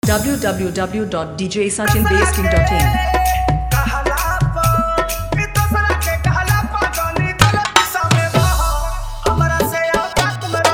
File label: Bhojpuri Dj Remix Song Hard; Dholki Vibration